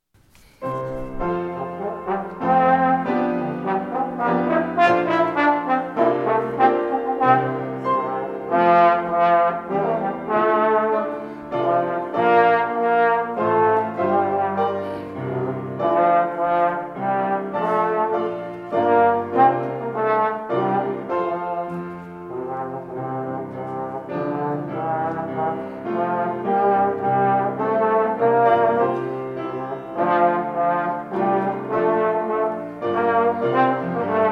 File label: trombone; o worship the king